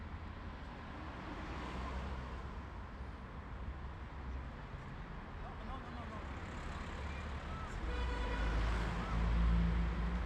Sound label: zoom h2 tramway; ambisonic